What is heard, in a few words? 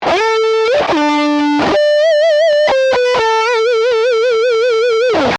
Lick, guitar